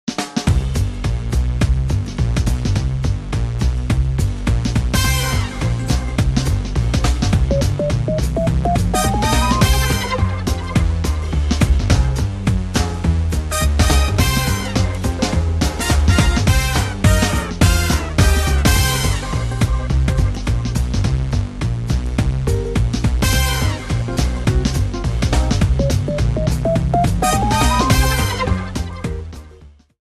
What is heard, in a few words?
Take the Coin